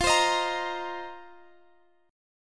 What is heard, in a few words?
F 7th Suspended 4th; F7sus4; x,x,15,17,16,18; strummed